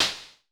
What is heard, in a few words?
SN Basic, Vermona Mono15 Drums Snare